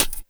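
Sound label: percussion, Drum & Bass; Ueberschall; Drum & Bass Resonance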